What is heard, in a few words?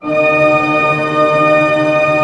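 tremolo A, orchestra; Sound On Sound; Peter Siedleczek's Orchestra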